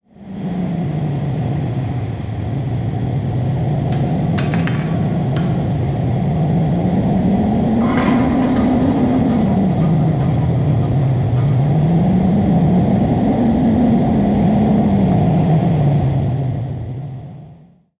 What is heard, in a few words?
cleaner wind